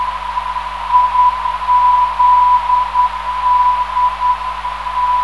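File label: non-directional beacon; IZD; 430kHz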